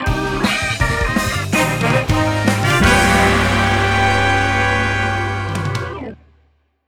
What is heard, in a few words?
Battle jingle